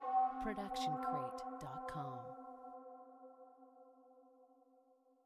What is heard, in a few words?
pipe calling; video game; video game bundle; soundscrate